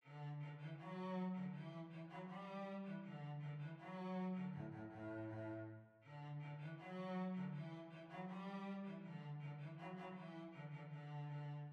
Hornpipe, Violoncello; Bang Up Hornpipe

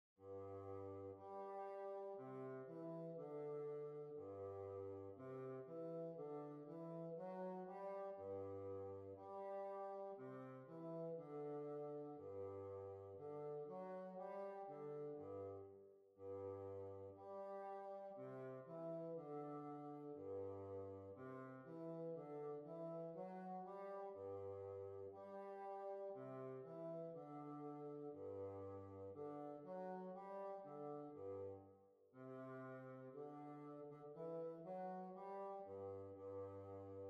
Kerry Mills Barn Dance

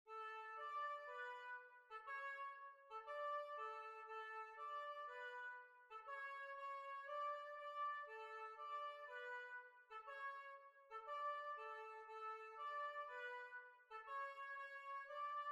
Jig

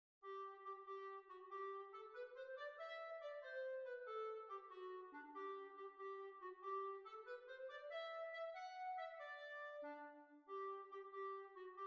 A Dance Clarinet B flat

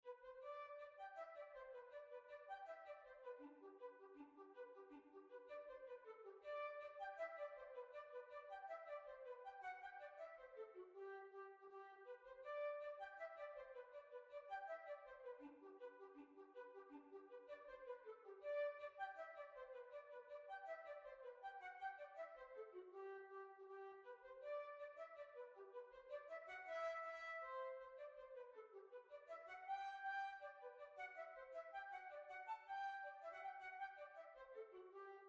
Holywell Hornpipe

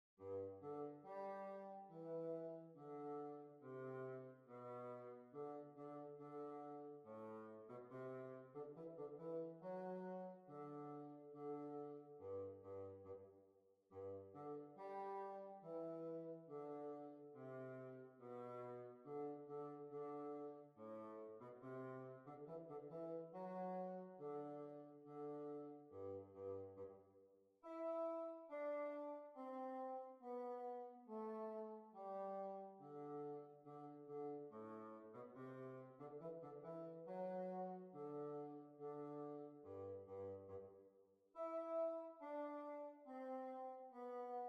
Hornpipe; London Hornpipe

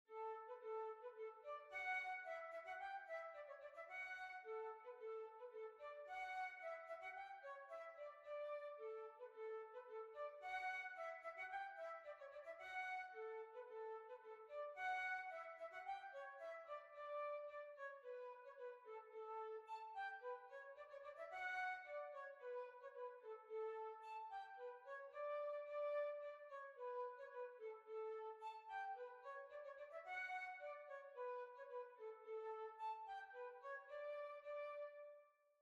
Heel and Toe Polka

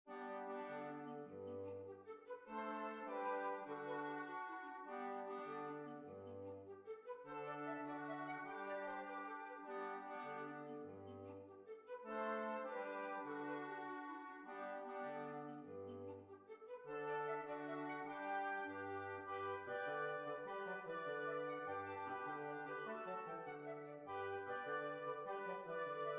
Jig; Mr Richardsons Jig

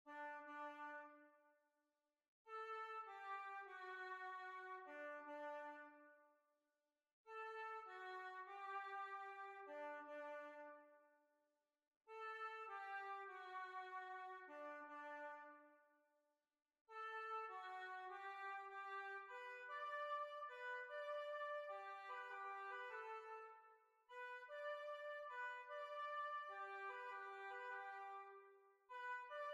Jig; Mr Richardsons Jig

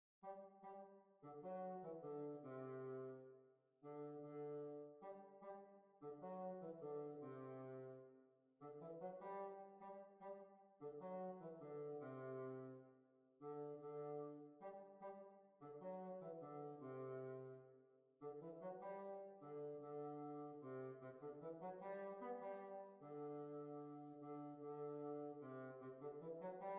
Jig

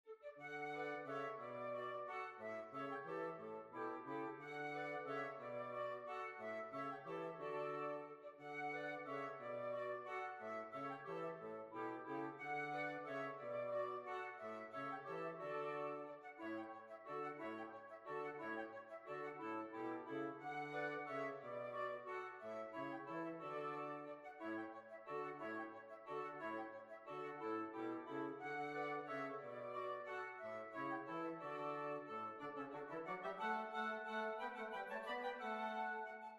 Swiss Waltz; Swiss Waltz II

